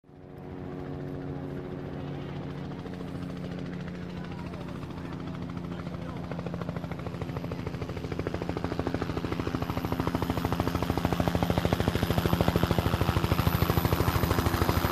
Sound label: Helicopter approaching and landing